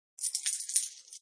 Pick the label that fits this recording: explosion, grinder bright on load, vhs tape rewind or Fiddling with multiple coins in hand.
Fiddling with multiple coins in hand